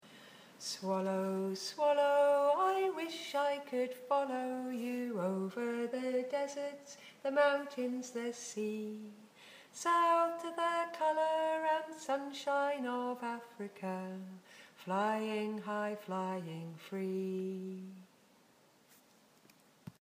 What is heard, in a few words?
Swallow Song